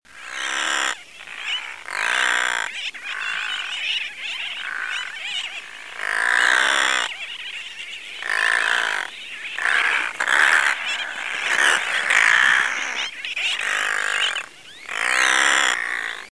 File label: Brown Noddy, songs